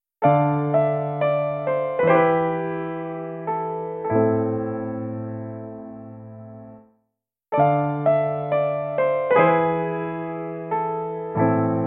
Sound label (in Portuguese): a tonalidade menor exemplo